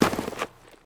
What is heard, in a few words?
snow, footsteps; player